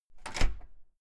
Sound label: entrance door close slam outside